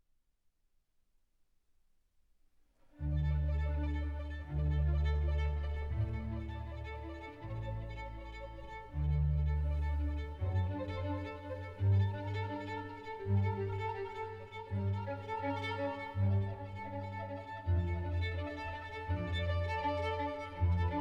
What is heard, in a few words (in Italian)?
imitazione delle campane; johann paul von westhoff